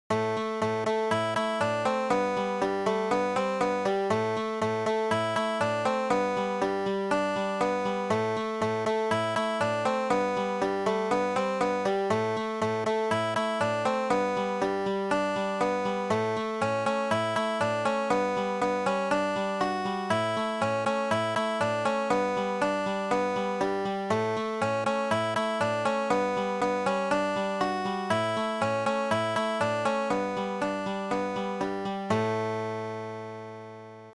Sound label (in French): bigouden melodie + basses